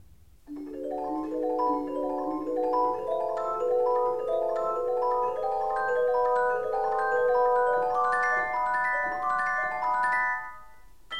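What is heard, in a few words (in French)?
Célesta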